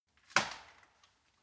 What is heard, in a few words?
wood limb crack big